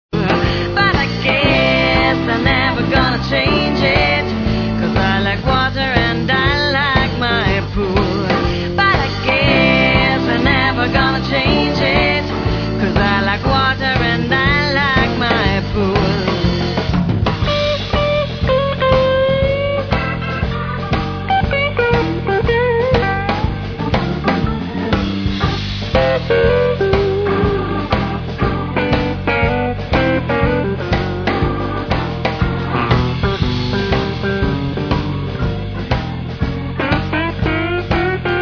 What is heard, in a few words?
blues